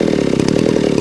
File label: chainsaw idle